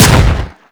Fire 2D Shot, Weapons; Warframe; Boar

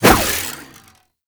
Staff Swing; Warframe; Duviri; Dax; Weapons

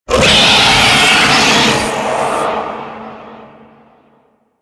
Vocal Screech; Warframe; The New War; Archon; Boreal

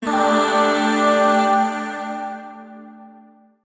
Shawzin Pent Maj Chord H; Warframe; Zariman; Void Shawzin; Chords

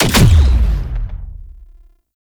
Wrist Gun Alt Punch; Weapons; Warframe; Tenno

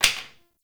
Plastic Broom Drop; Warframe; Ambience; Nineteen Ninety Nine

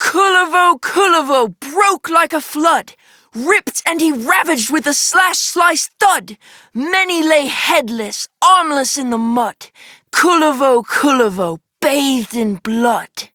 Child M, Childrens Rhymes; Warframe; Duviri; Kullervo

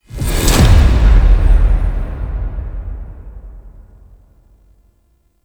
Boss Fight Door Open Stinger; Warframe; Captain Vor

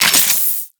Modular Secondary Handle Reload, Guns; Warframe; SU Modular Guns; Reloads

